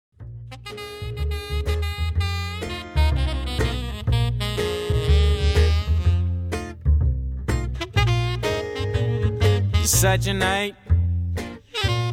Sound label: New Orleans Blues Voc Sax Acoustic Guitar Upright Bass